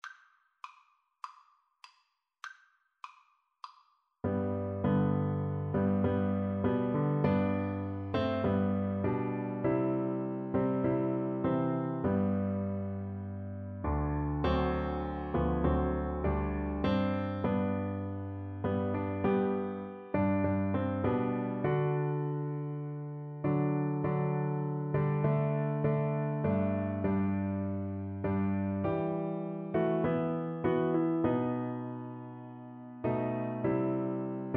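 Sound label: viola karaoke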